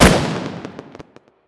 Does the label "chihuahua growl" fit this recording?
No